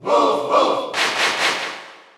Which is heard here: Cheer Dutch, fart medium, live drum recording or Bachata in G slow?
Cheer Dutch